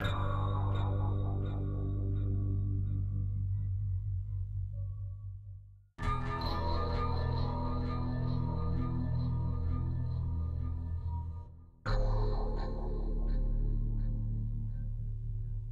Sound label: ambience pads; Ben 10 - Omnicode